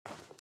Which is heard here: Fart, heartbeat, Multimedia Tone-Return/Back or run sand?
run sand